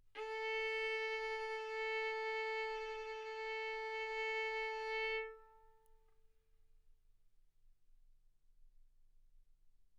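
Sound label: Violin arco mf A string A4 B4 stereo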